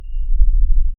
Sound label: short eerie confirmation